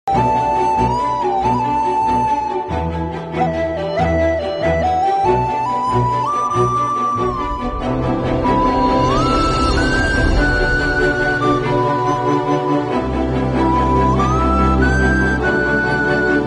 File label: love ringtone; Singapore Saloon; Ravi